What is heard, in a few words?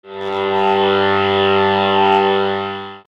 drone g; Synthasis